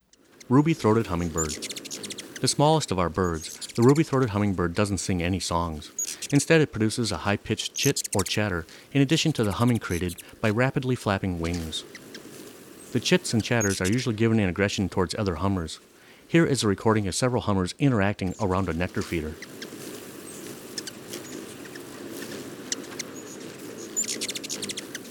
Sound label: Ruby throated Hummingbird